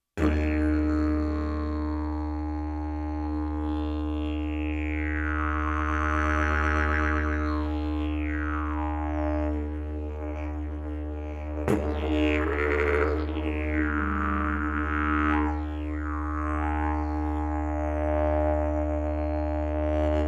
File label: didge